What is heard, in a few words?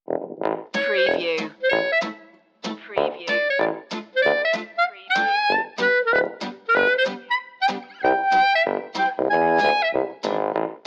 capital time no piano